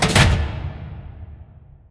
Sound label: sliding door lg verb open start; beacon; Titanfall 2